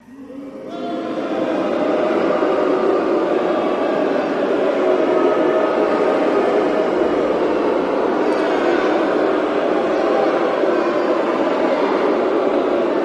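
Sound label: Crowd Boos Interior Gym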